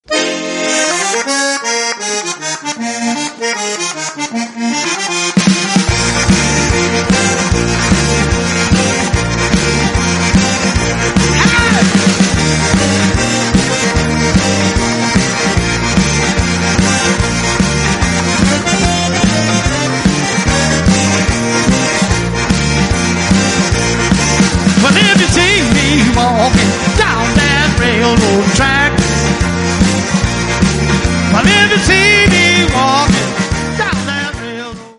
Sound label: railroad blues